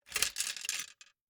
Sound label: Gumball machine, metal, glass, candy, switch, mechanism, rotate, dropping, jostle; Eiravaein Works; Vaeyan IV